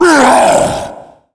tank attack, zombie vo; Left 4 Fortress

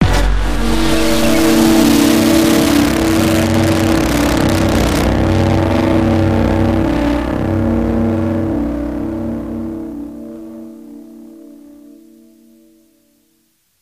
pong

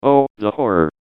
pyro negative vocalization; John Madden

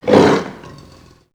attack; tf; slender; gabel

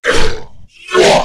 attack; tera creatures; abomination guard

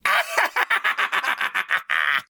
mad laugh; Serpentine

